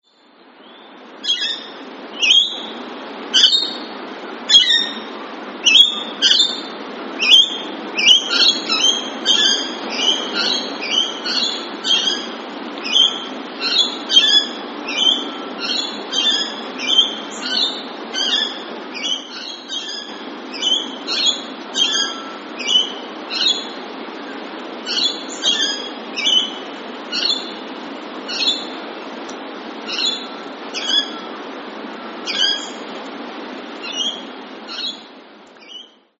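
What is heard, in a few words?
Rainbow Lorikeet shrieks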